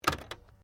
Stereo Akai Mini Tape Deck Close, Multi function music player